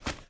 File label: STEPS Dirt, Run